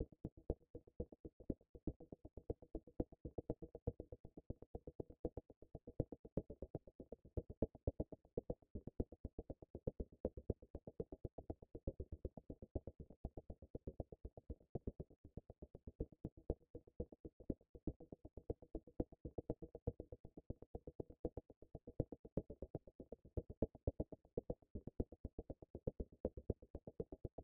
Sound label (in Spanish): Grove ruido